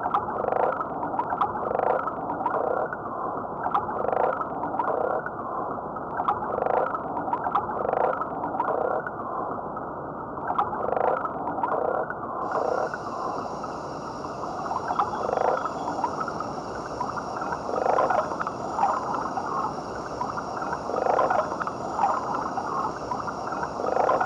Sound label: Osteocephalus buckleyi